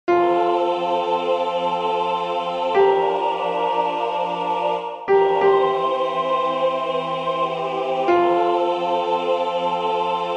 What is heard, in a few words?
Ave Maria Bach Alto; Gounod